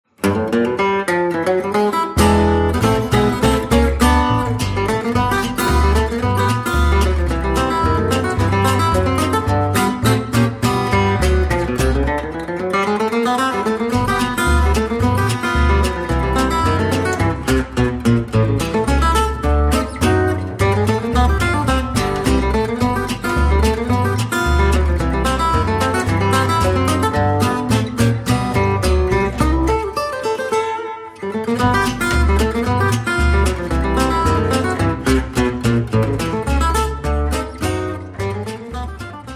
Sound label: pickin the guitar